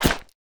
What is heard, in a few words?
step; block